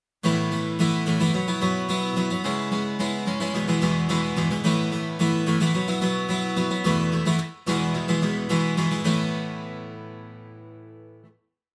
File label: guitar